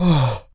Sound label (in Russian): korotkij vidoh so stonom